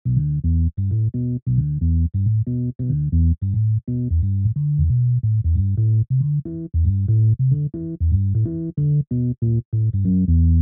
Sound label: EPiano Bass; electrickeys; keyboard bass